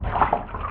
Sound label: swim; ludum dare 48